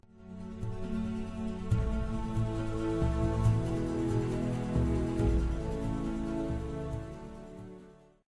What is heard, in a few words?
OOBE Music